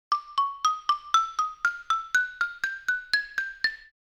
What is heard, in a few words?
Cartoon sneaky tiny walk